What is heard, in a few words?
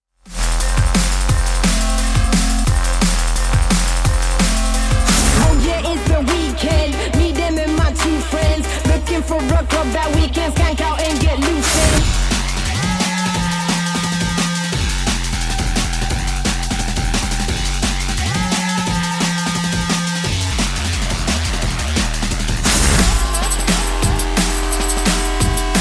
drum sound bassline close